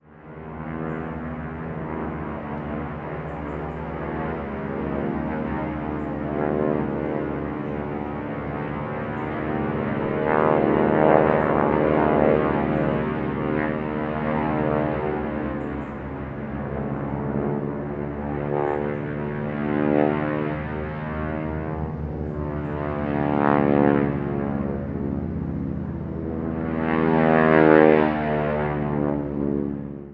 transportation aircraft military boeing stearman b75 biplanes flying distant; sound effects one